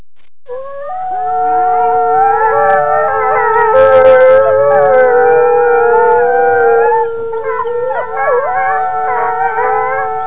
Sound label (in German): Wolf, Wolfs heulen und Knurren